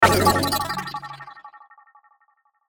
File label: button digital bleeps; Laser, Distortion, Rear To Front, Movement, Production Element, Imaging Element, Accent, Transition; Multimedia